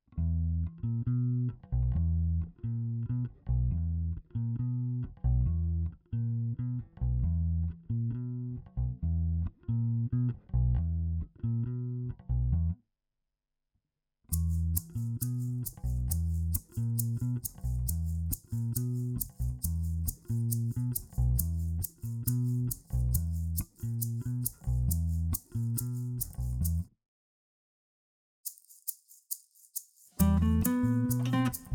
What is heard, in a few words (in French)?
Explosif Bossa